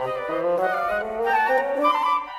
Winds, Orchestral; Sonic Foundry (Sony Creative Software); Orchestral VOL-2; Modern 18

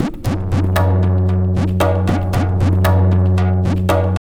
Tabla, 115 BPM; Zero-G; Pure Tabla

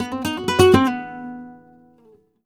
Remates De Pulgar, WAV Guitar, Flamenco

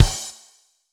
DRUMLOOPS, Disco

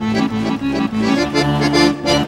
ACC BRK, ACC BREAKS; Best Service; World Colours

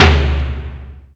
tom, Yamaha TG-500

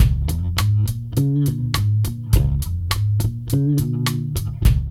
LOOP

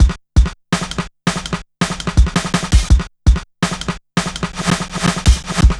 Shuffling Live, Jungle Drum Loops

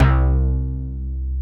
SLOPPY BASS; BASS SOUNDS

